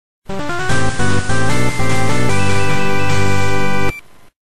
item get; cave story

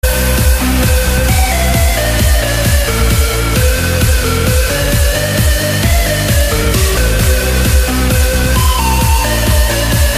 trance; A state of trance

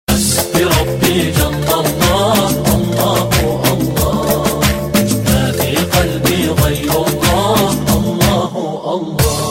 Arabic Tune

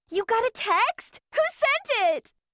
Text Message; Noire

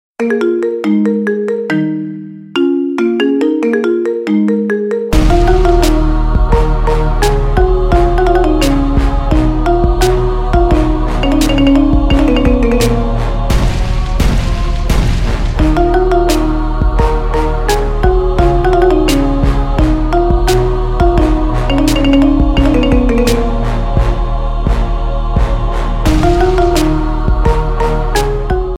Marimba

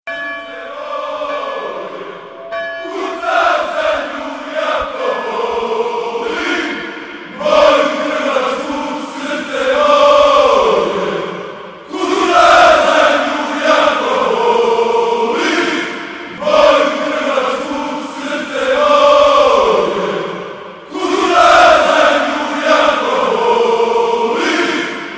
Football song Croatia

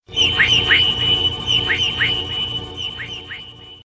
Squeal; Digivolution

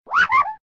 Wahahoo

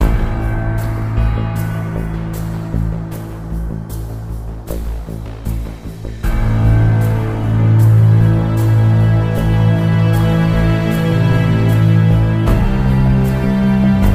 Startup

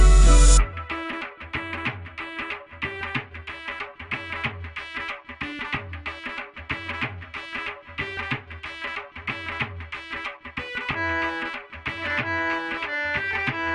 Desi Ringtone

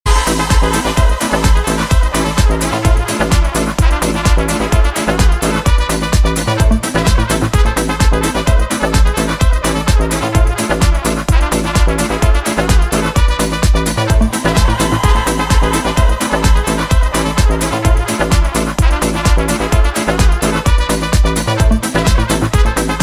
Fiesta Electronik

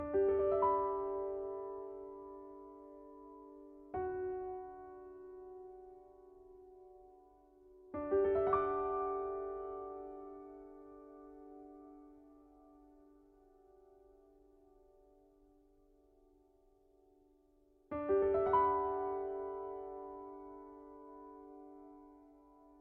Piano Notify

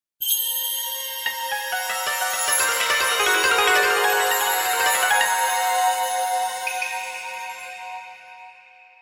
Star Jingle; Okami

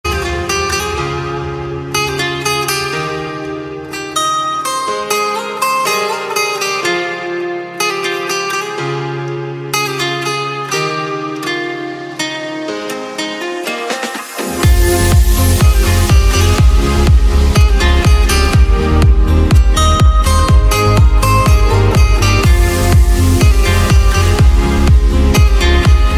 Chill Out

